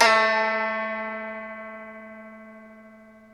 SU GZHENG, Earth Tones

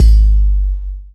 Yamaha EX-5

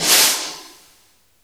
Brush Roll Snare, Drum Machines Roland R-5

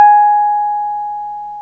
PNO, Roland Keys of the 60s and 70s, Wurly; Roland LCDP10 Keys of the 60s and 70s 2; Wurly 200

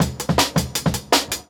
Jungle Breaks; Original Jungle Breaks

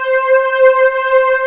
SOFT PAD, KORG O1/W; Rubber Chicken Software Sample Bank

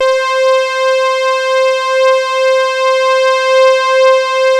SAW, Synth lead

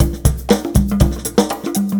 120 VINYL, DRUMS

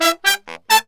MERENGUE, World Grooves

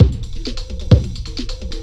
FILTER, Big Beat Drumloops

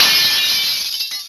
Glass; Yamaha DTXpress; Effect 1

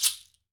TANZANIAN, African Perc

